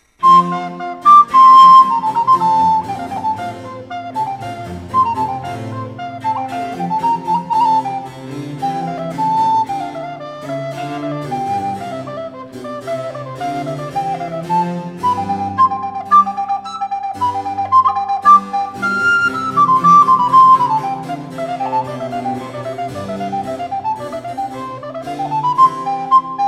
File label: Allegro Alto, recorders; Telemann; Von Huene